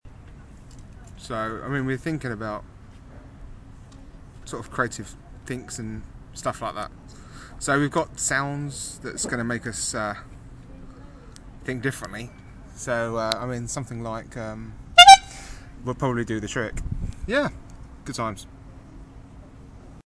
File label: honk